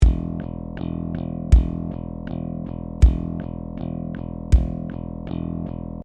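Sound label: sustain bass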